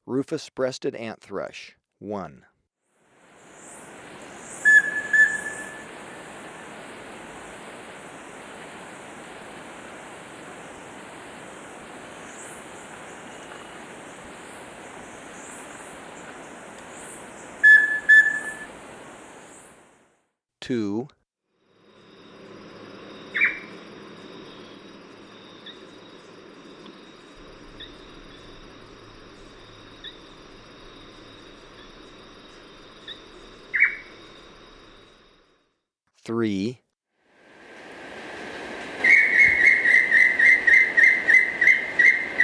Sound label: Rufous-Breasted Antthrush; S. America